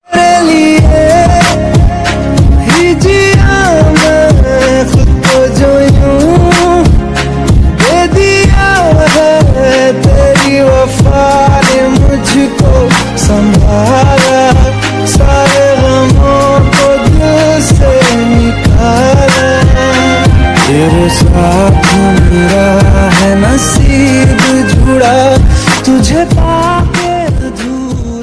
hindi love ringtone, bollywood ringtones

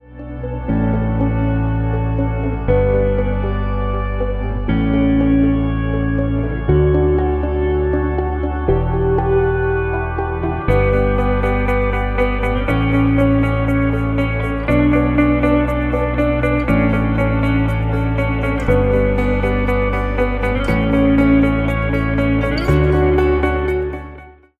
inspiring ringtone

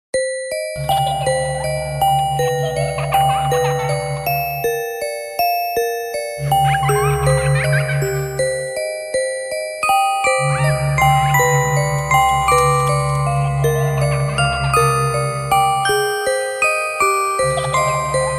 creepy laughter music box phone tone

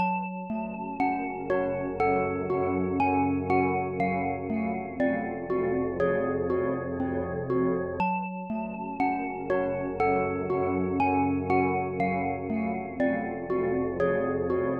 nokia android suspense ringtone

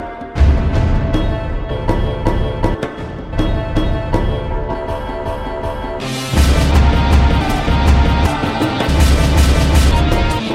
samsung ringtone; a50

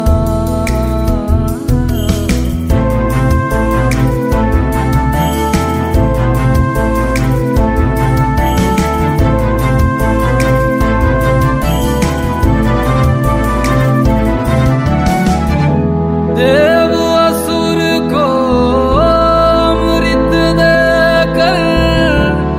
hindi ringtone, bollywood

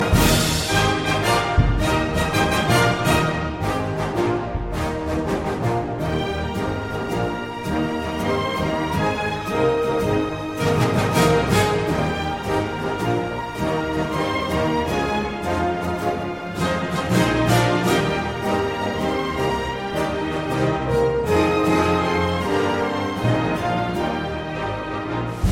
western theme ringtone; iphone ringtones

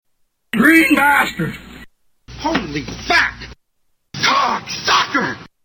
bubbles ringtone

